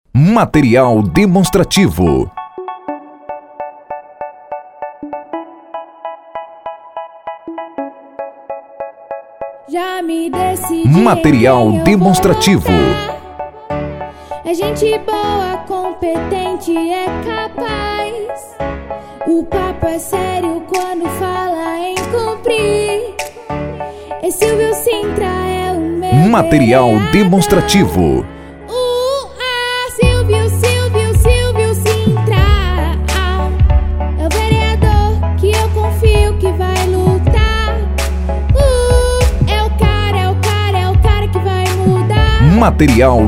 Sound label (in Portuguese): Jingle Parodia